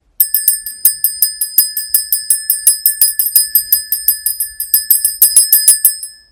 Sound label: chisel bell loud